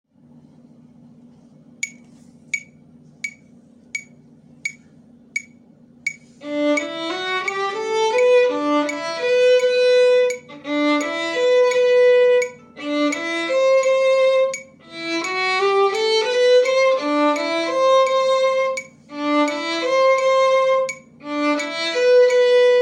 Lento con metronomo

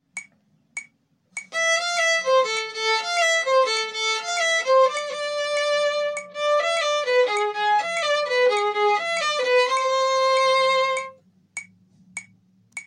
Extracto A Normal con metronomo, cumbia

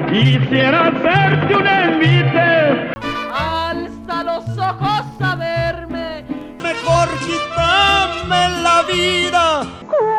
glisando indefinido

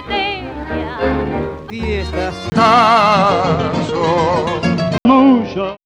Glissando descendente

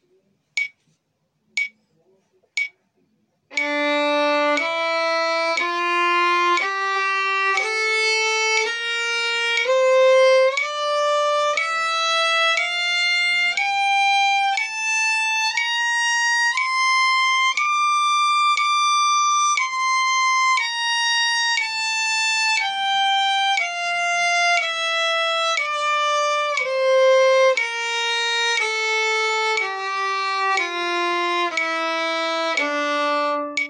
Escala de re menor con metronomo

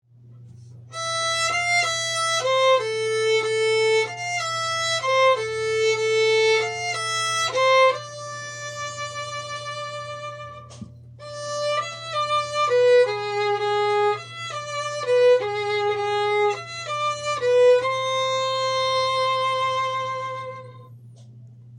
Extracto A lento

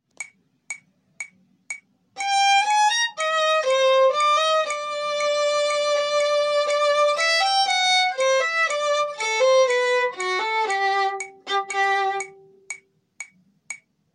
Extracto C normal con metronomo

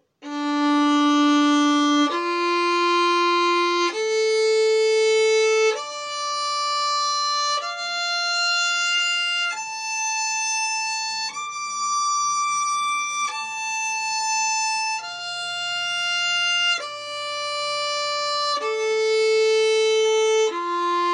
Arpegio de re menor

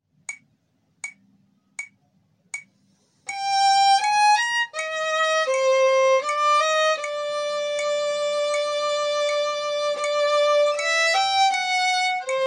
Extracto C lento con metronomo

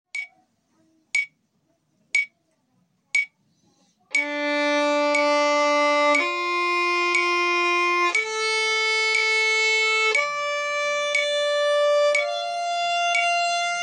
Arpegio de re menor con metronomo

